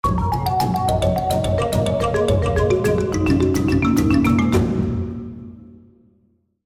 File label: musical spin; Shamans Dream 2; reel